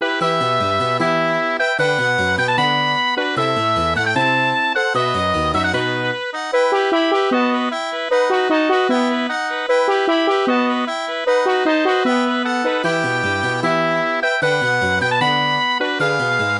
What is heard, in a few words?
MIDI